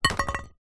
food potato chip crisp cheese puff single drop onto plate; zapsplat